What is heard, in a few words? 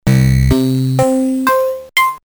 amiga koto; MUSIC TRACKER